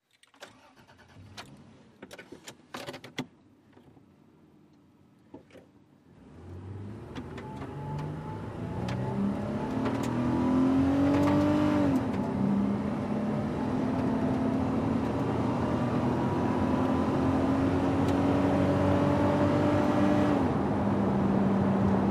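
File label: SUV Interior Accel 100mph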